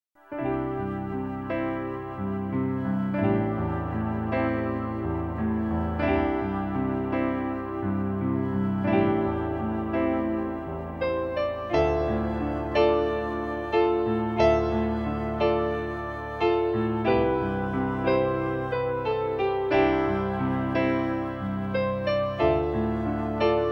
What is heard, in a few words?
Music- Sorrow Theme